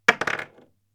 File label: Metal Piece Falling On Table